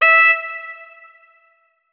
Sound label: notes, saxophone; Hideout Furniture